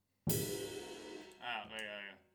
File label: Ride